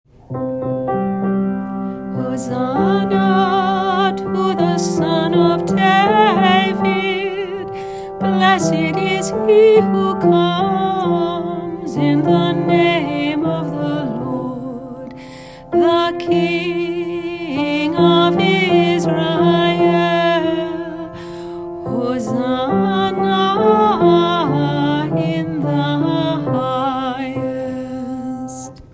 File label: Antiphon; Palm Sunday